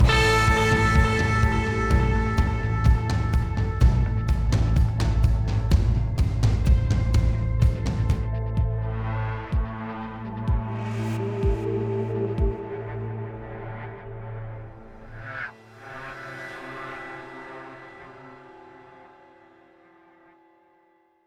Wind Outro 126bpm; battlefield hardline; ps3 ps4 windows xbox 360 xbox one

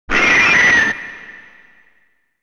Enemy Voice (Large Insects); genshitou prehistoric isle

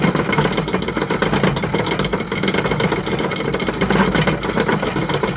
elevator Winch Loop

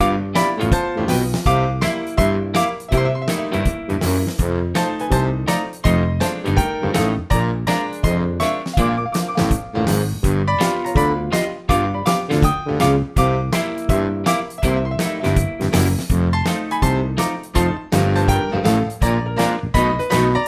silly ball

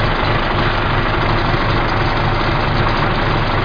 Engine; In the Line of Duty Firefighter

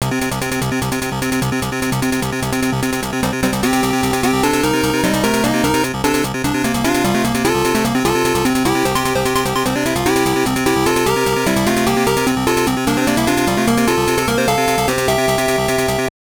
BGM; kattobi road; gb

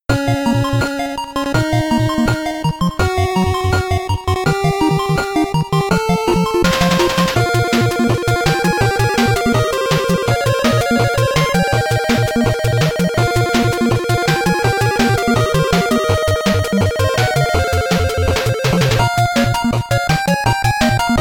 BGM BOSS Intro 8bit; Mighty No. 9; 8-bit soundtrack

